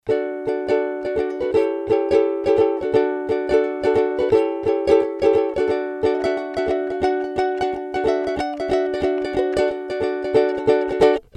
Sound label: ukulele